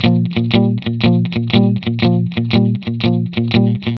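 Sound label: 120, Vintage Blues Guitar rhythm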